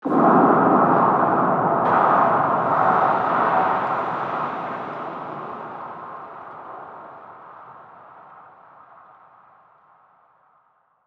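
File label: mega shipyard metal ambience; Stellaris; federations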